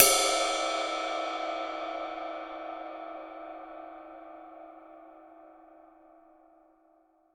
24 Ride; hydrogen drum samples

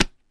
tabla dhec

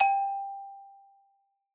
Xylophone Soft G4 ff far, Soft Mallets; Idiophones; Struck Idiophones

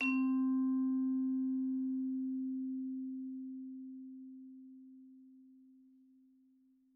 Vibes soft C3, Vibraphone, Soft Mallets; Struck Idiophones

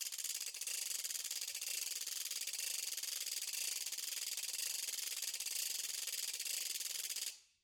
Ratchet Slow; Idiophones; Struck Idiophones

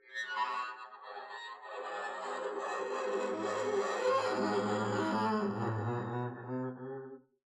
arco noise extra high screechy long, double bass; dsmolken; noises

